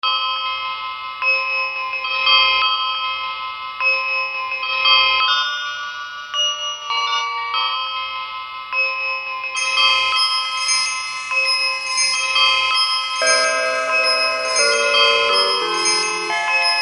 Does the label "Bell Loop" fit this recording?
Yes